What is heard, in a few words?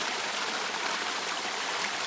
Waterfall; OpenClonk; Environment